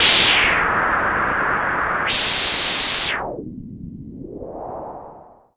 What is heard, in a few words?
Super Bass Station, Filtered Noise; noise hiss crackle